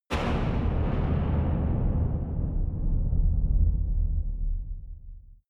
massive metal impact; impacts

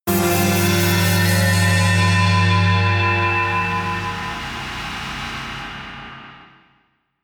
synth slice high, drones

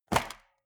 metal box small open; soundpool

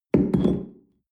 espresso upset tablecloth soft

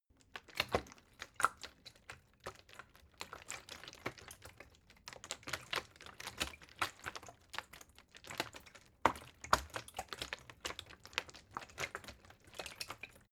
bottle water sparse handling; gastronomie; berufe

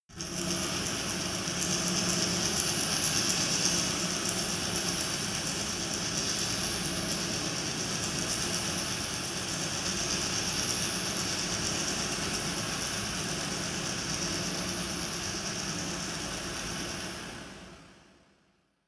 dark resonator rattle slow soft; maschinen

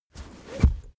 car armrest backseat close fast hard

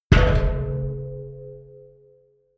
massive metal gas bottle low

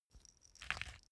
small pepper grinder glass ringing; industrie-wirtschaft; gastronomie

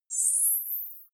zoom out, signale

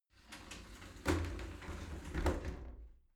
shower slide door close soft

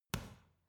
boxing punching bag side hook medium; sport freizeit; boxen

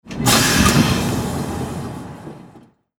train door opening

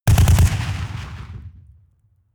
rifle ak-47 exterior fire burst bass; schusswaffen; Firearms

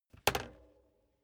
bicycle street kickstand hinge down; fahrrad; verkehr transport

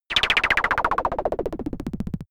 wobble; bewegung; comedy-cartoon; soundpool

